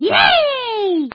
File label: yay; toad